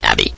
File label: happy; PC World Komputer 1998 July & August; Pronto; POND